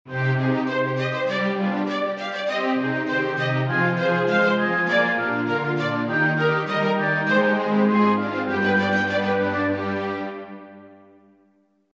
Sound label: Instrumental; Bach; St John Passion